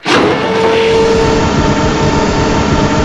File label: garage lift loop